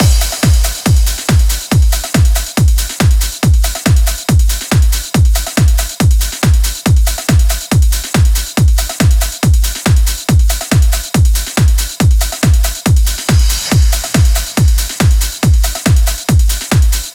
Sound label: Selection Kit 140BPM Drum Mix 16 bars; Drums & Perc; Vengeance Samples; E minor